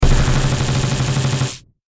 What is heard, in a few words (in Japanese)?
マシンガン 長